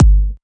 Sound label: clicky phat kick; Kicks; single hits